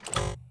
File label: ui negative